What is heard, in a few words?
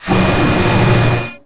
mech arm; Tony Hawk's Project 8; XBOX